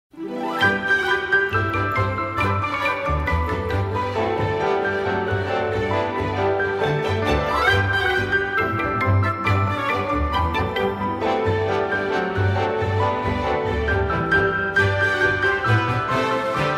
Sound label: holiday trad